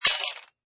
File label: Pottery Break, Small pottery, porcelain or cutlery impac; crash; Small pottery, porcelain or cutlery impact with crash; Breaking Sound Effects; LynneMusic